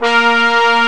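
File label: A#3 TROMBONES; ORCH.COMP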